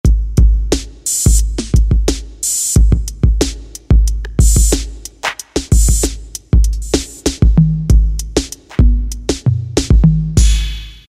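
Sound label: Analog Kit; Yamaha DJX; Voices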